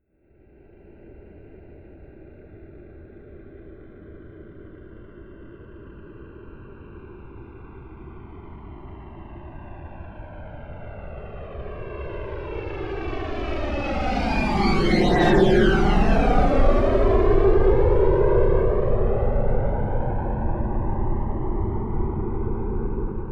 military jet c-17 globemaster flyby left to right close; flugzeuge jets; verkehr transport